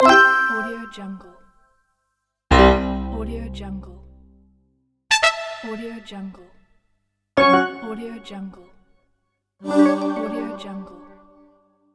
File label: gui app menu sounds